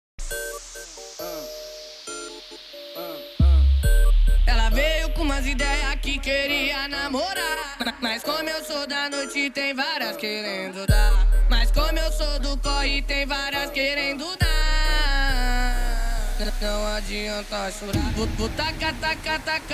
mega funk funk

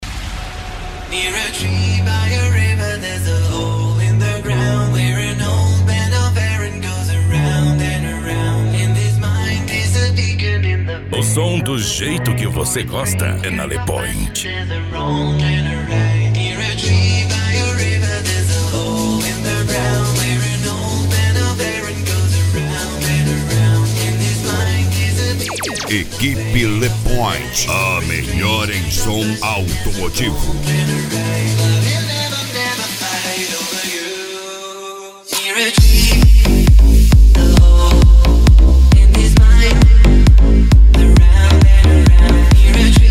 deep house e pisadinha; le point som e acessorios; funk

